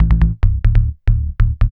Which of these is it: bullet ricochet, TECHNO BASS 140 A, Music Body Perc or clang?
TECHNO BASS 140 A